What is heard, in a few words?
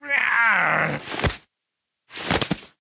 Wizard Death